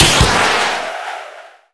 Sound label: Rocket End; Skylanders Cloud Patrol; Arcade, iOS, Mobile; MagicItem